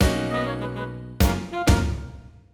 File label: Lose